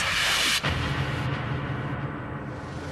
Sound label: robot wars cease sound